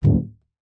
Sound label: foley balloon large bounce; sound effects one